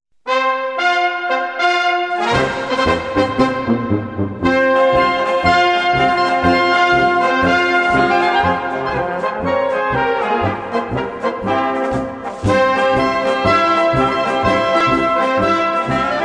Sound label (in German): Feuerwehrmarsch